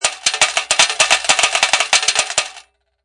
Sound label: Coin Slot Pay Out, Casino, gambling, Slot Machine: Multiple coins drop into payout cup.; Slot Machine Sound Effects